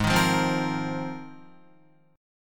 G sharp 6th Add 9th, Guitar; G#6add9; strummed; 4,6,3,5,5,5; 4E,4A,5D,5G,5C,6F

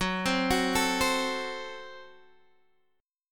Gb Diminished, down, Guitar, Standard; arpeggiated; JGuitar

